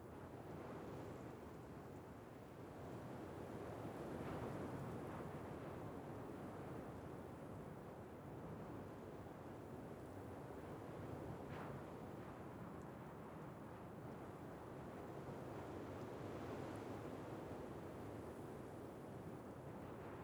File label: ambience desert breeze; Rust